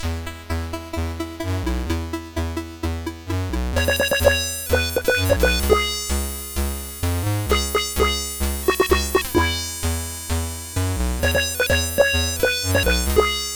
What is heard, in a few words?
CSG8580